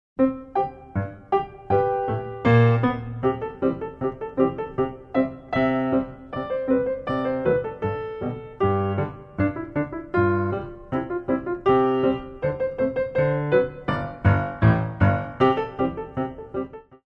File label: jump; tendu